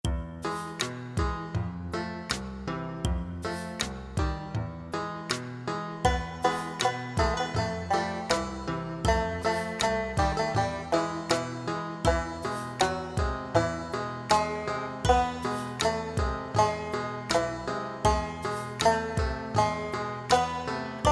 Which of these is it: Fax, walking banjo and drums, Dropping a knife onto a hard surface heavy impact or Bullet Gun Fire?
walking banjo and drums